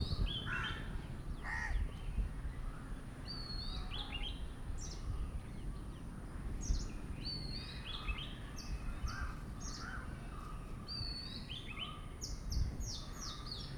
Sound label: birds calm, South India; South India street recordings